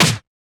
Snare; FLPRO; Snares

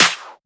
Clap; FLPRO

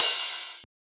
Ride; FLPRO

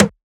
Percussion; FLPRO

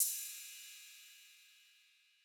Cymbal

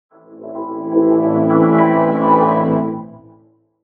Windows XP Startup Sound in Brass Swell, Vocoder

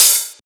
Open Hat; FLPRO

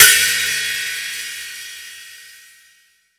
Ride

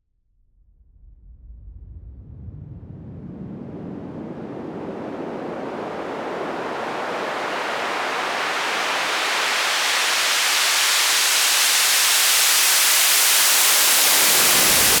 Raise Clean

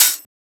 Open Hat; FLPRO

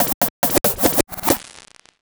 EDM Drum Fill; FL PRO

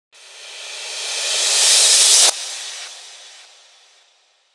ReCrash; FLPRO

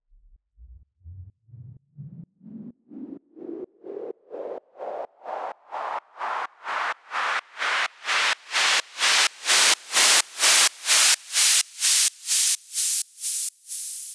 Raise SideChained

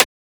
Transient; FLPRO

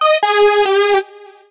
instrument loop

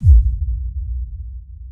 Small Reverb Kick

